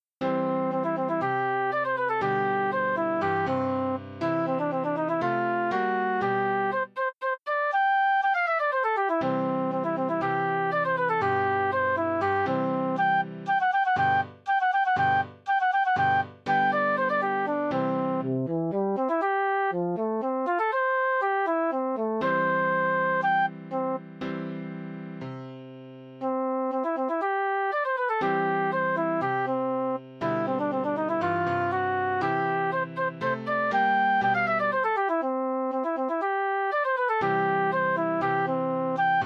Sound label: Flute